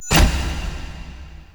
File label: Stairs; Warframe; Entrati; Vaults